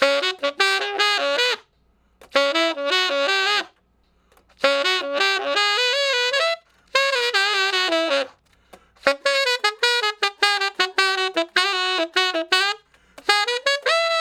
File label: Sax Shuffle (Db); Solos; Ten Sax